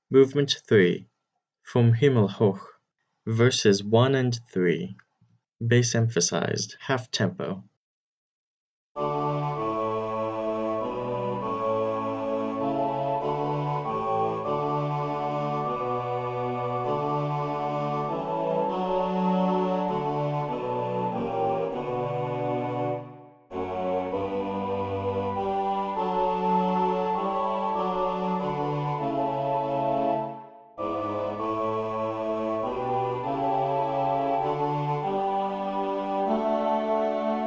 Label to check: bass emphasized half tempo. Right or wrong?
Right